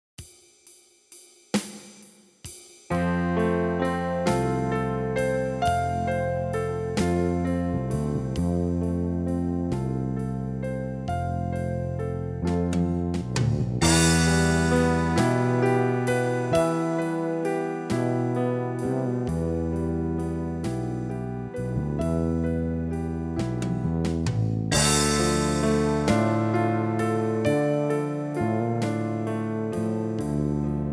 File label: Cross Hand Arpeggios Slow